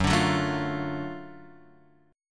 Gb Minor Major 7th Sharp 5th Gb, down, Guitar, Open F; strummed; JGuitar